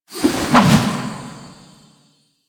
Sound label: Glyph Ball Throw; Warframe; Duviri; Glyph Puzzle